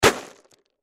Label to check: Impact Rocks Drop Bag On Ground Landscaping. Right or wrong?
Right